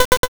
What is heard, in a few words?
picoeat